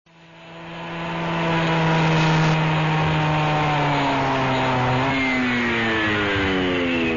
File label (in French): machine travaux